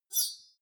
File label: metal lid screech high